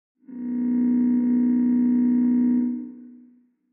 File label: Alarm Horn Exterior